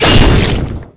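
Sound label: onslaught axe attack; smashing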